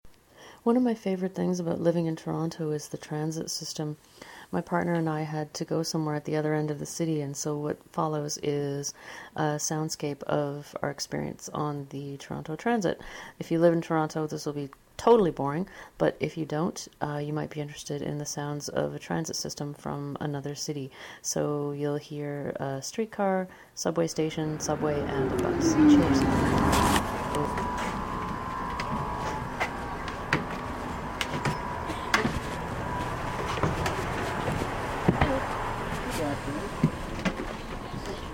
vehicles from everywhere soundscape on toronto transit